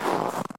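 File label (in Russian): шаг по скрипучему снегу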